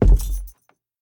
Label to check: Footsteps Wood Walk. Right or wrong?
Right